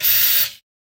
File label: snake attack